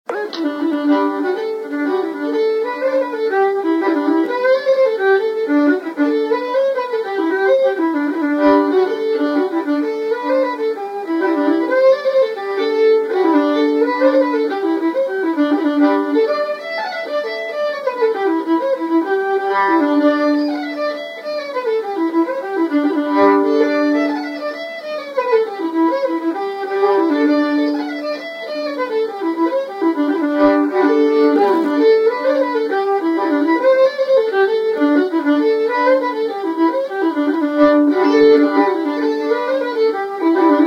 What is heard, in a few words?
jig; The hags purse